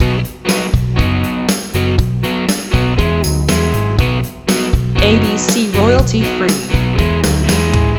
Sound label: Cool Indie Rock loop